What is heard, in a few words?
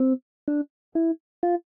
Melody Norm